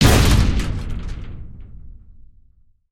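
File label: Generator Hit; ambience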